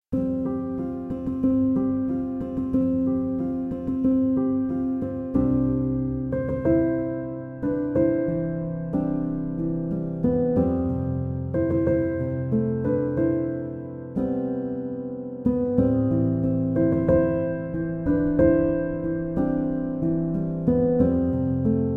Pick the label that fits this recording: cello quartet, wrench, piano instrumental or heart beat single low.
piano instrumental